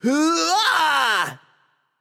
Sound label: battlecry; smite; tsukuyomi